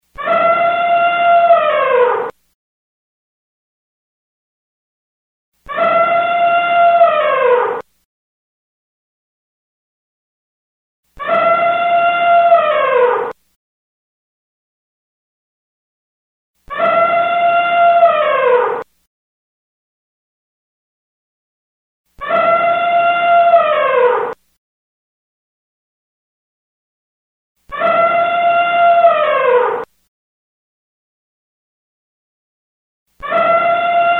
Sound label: Elephant